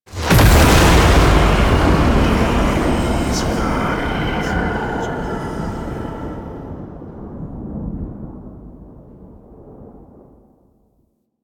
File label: Alchemy Fully Mixed Stinger; Warframe; Entrati